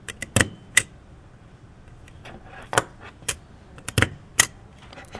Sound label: doncaster rail station